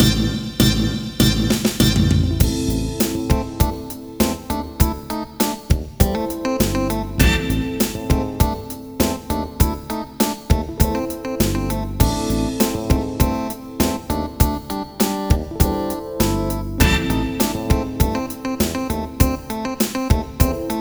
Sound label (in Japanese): ちょっとレトロでかっこいいfunkyな曲。